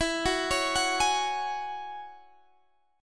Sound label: G sharp 7th Suspended 4th Sharp 5th